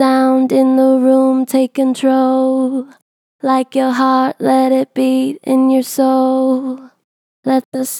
Natural Vibrato Gentle, Harmor Vocals

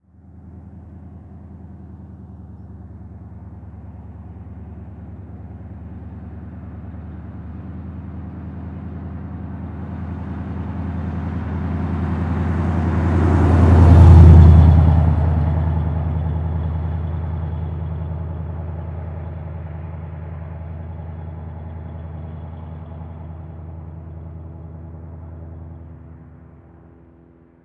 Muscle Car Slow By 10MPH; Vehicles; Cars & Trucks

